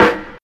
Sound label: nice trap acoustic snare b key